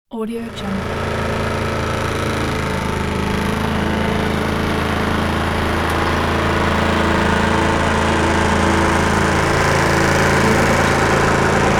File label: small tractor mowing and stopping; fxprosound